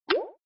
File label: btn button; mahjong ningxia